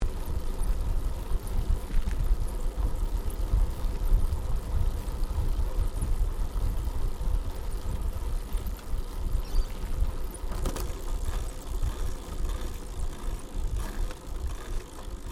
Bicycle Riding Smooth Concrete Gear Changes Freewheeling Stereo, 21 speed road bicycle; Giant Crx3